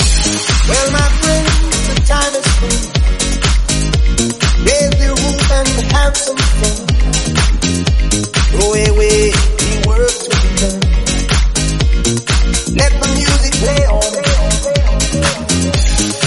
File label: Club House 122bpm Ebm; remixplanet